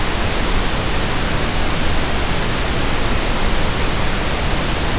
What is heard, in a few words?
pink noise